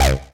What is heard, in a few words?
303 Acid C; Vengeance Samples